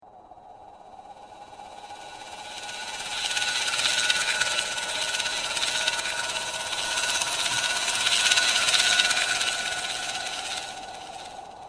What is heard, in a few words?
Creepy Horror Night Crawlers, Monster Sound Effects; Andrew Fleming